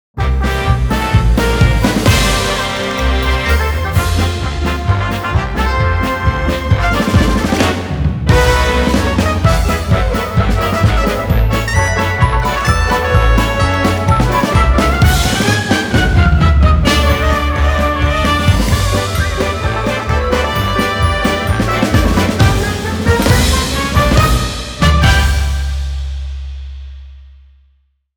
Misty Waltz; Infinity Nikki; Tears of Memory

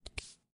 Grab Limb; lethal company

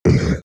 Monster Grunt; gamerip; The Binding of Isaac

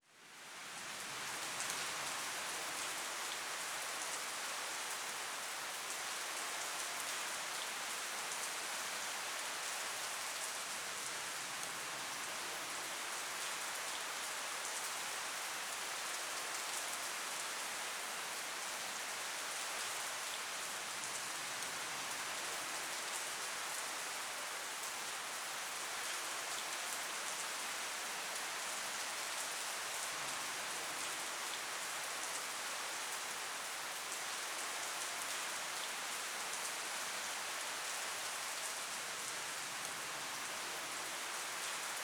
Rain Long Weak; D4DJ Groovy Mix; Android, iOS, Mobile

